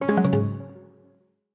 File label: streaming end; Medal